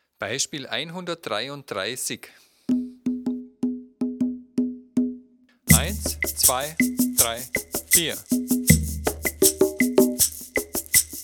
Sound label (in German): viererrhythmus